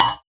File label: Moody Park Playground Perc; Houston